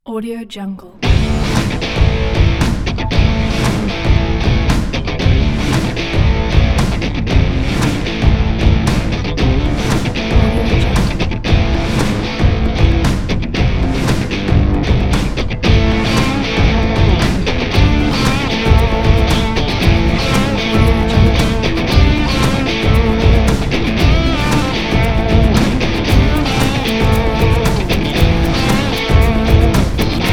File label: stomp rock